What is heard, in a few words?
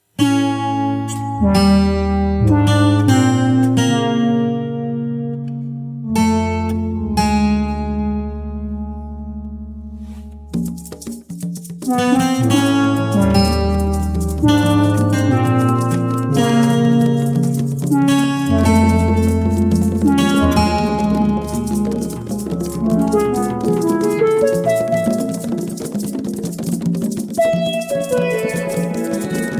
Conga